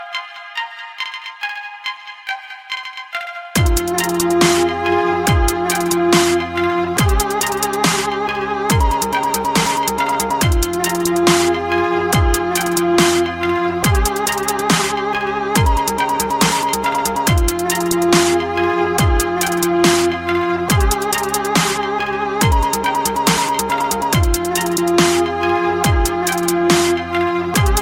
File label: classic dubstep